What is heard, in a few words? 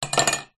small metal pole lean against surface; foley